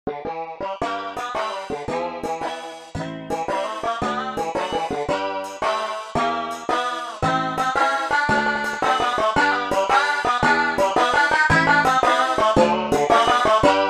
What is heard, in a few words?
Pause Music; Pacman 2